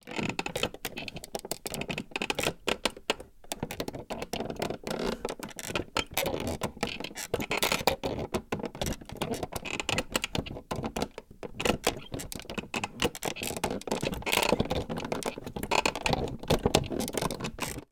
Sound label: foley wood creaks heavy object